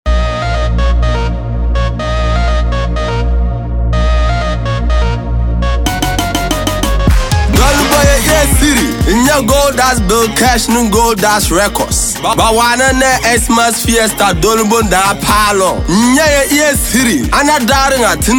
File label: jingle